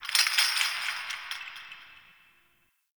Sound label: Chain Rattle Distant, Ambience; Champion Crypt